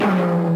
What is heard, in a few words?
Pan Hit; Tom & Jerry